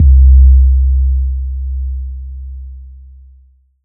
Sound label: Kick Pump It